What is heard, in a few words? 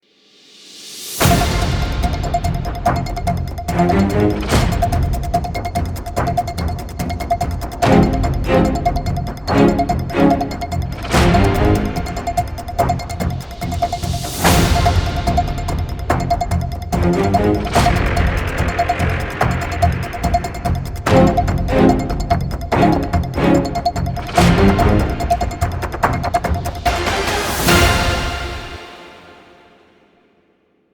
Quiz Show Timer 30 Sec Music